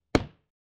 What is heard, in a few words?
Hit